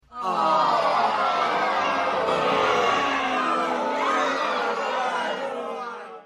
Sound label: crowd disappoint